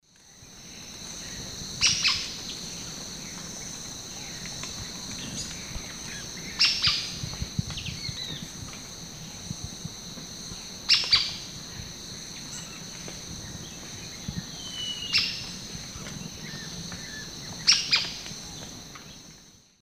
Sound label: Automolus infuscatus, call; S. America; Eastern Lowlands